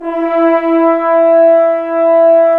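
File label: brass trombones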